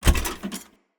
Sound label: Step Default, Footsteps; Enemy; Characters; Mendicant; Mnd King